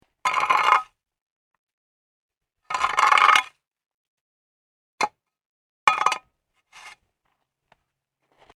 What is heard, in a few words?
Shovel Clearing Snow; Snow Sound Effects